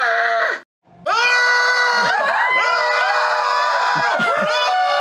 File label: Screaming